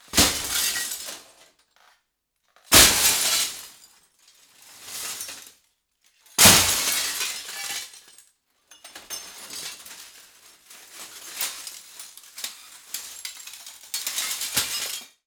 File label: Glas Window Slices Hard Drop Impact Debris Movement; The Soundcatcher; The Glas Smash Sessions